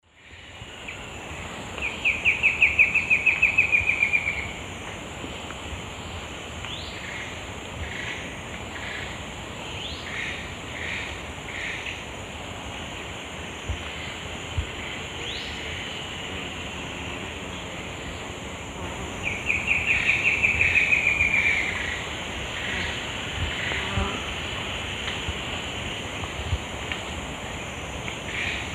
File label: Myrmotherula ignota, song; S. America; Eastern Lowlands